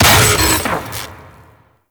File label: Security Camera Alarm Destroy; Ambience; Gameplay; Warframe; Grineer; Fortress